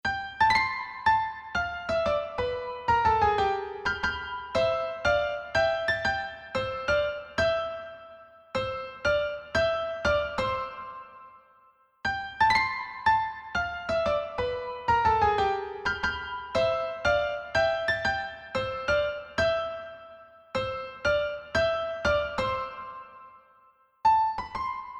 only melody

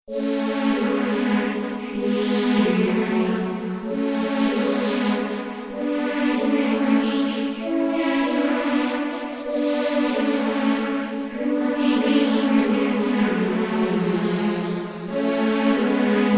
landing UFO, only melody

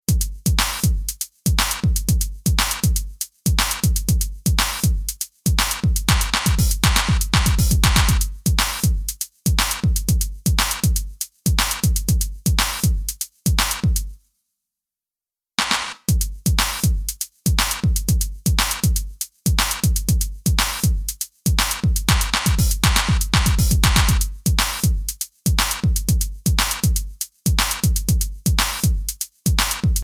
Satellite pulse only bass

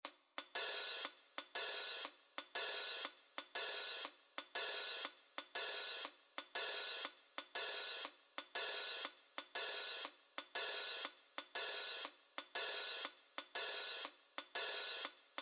only drum

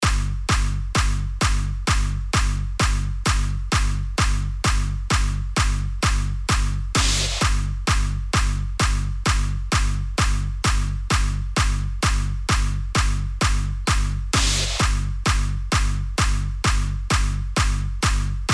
Bull Horns Drum FX

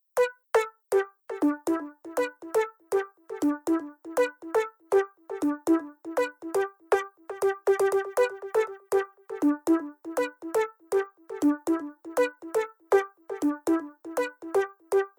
only melody

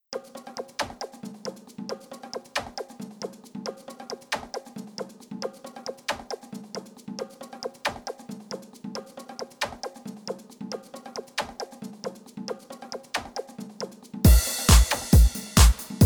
Only Bass, funk

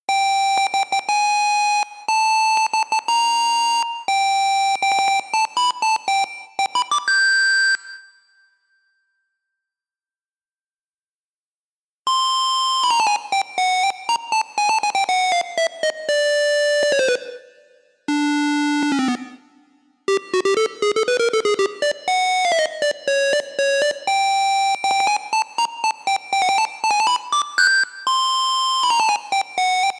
Silly March only melody

